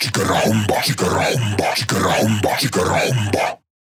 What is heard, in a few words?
138 Shamanic Voice (Dry), Psytrance